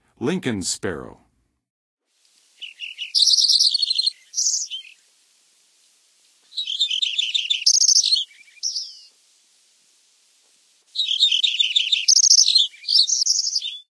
Lincolns Sparrow, Songs of Birds of North America; Western USA; Western USA Songbirds

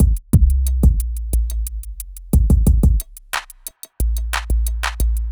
r and b

